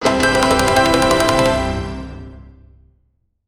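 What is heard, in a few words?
steampunk clockwork fanfare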